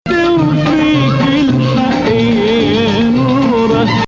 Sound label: Nahawand